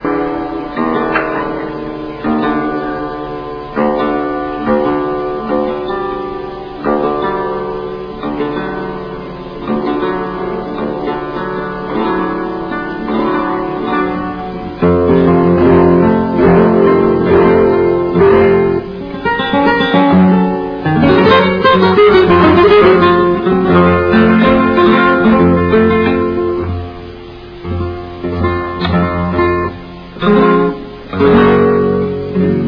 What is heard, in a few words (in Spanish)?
Guitarras lorquianas